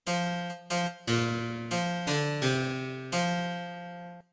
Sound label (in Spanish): clavicordio bajo